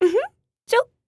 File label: kiss; matgo